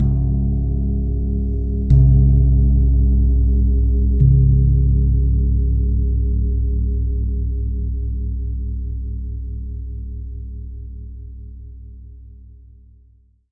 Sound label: Long Gong Multi Hit; Longevity Gong; ETHNIC and WORLD PERCUSSION; Longevity Gong by Amani